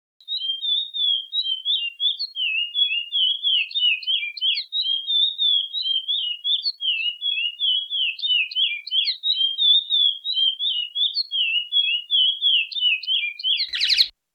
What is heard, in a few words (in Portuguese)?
canto; das alterosas